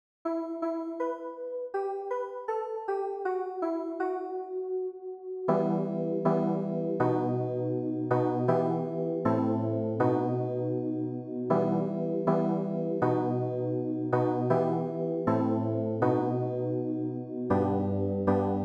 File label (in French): ANAMNESE Trinite CLAVIERS